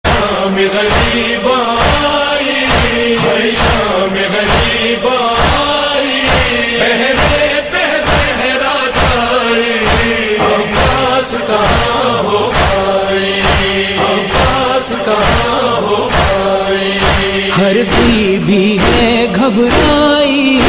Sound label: noha tone ringtone